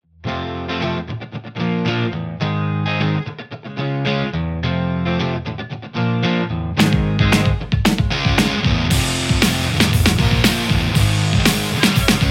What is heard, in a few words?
strat; mercuriall harlequin